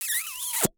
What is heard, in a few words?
Human Male Kiss